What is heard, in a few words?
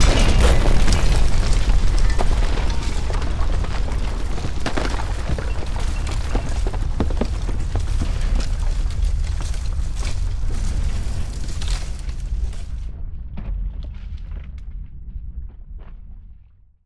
mixed falling rubble explosion impact; Bluezone; Bomb Blast Explosion and Debris Sound Elements